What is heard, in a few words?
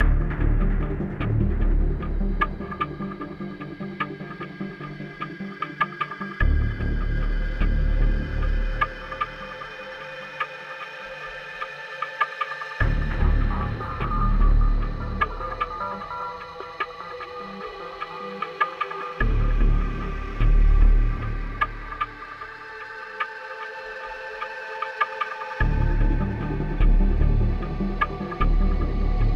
Aftermath Ambient; modern combat 4 zero hour; android